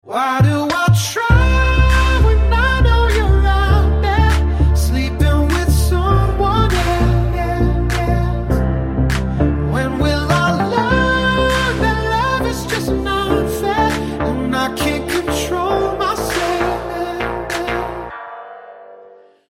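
lovely song excellent musical accompaniment romantic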